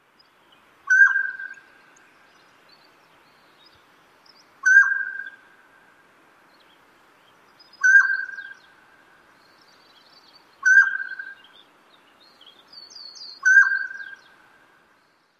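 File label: Mountain Quail, song; CA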